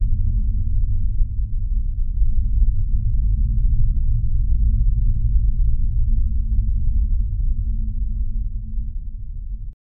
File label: low paranormal hum undern